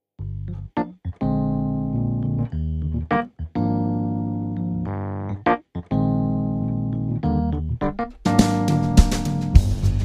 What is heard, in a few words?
Pop Jazz